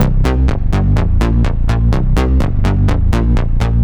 Synth, 125bpm, Nu Dance Electro; Zero-G; Soundclash; Combi Loops; Kaos Theory

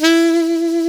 A3 sax, soft sax; East West - Global Sounds

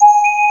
DG WAVE, DIGI SYN; AKAI S6000 CD-ROM - Volume 1; SAMPLE WAVE MIXING 1

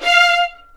F5 VLNS ARCO DB; East West; The Ultimate String Collection

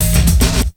Fill; Sonic Foundry (Sony Creative Software); Esoterik Beatz; PH7 FX

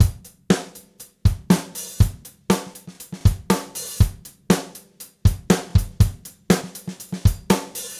Gretsch groove 120 BPM, 1967 Gretsch Kit drums

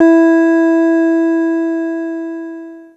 WINE GLS, Synclavier Sampler; Synclavier Sampler Library; KEYS AND GUITARS

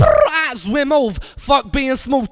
VOX SUNG; Megabass Remix! 2

